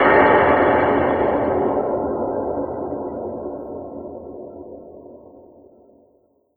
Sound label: sci fi explosion